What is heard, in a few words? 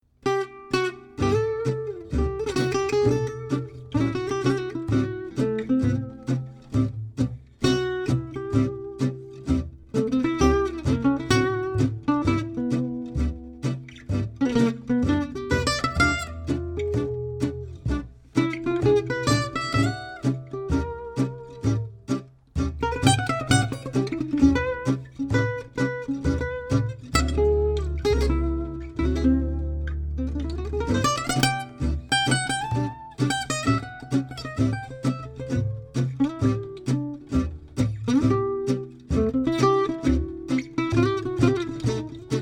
gypsy jazz